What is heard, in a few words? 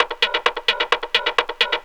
130 addon, DRUMLOOPS, HIHAT